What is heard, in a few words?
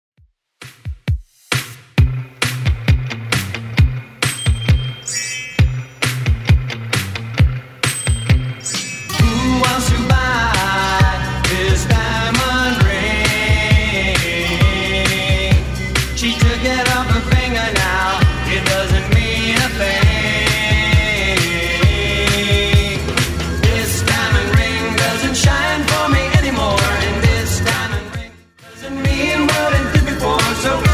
60s Redrum 133bpm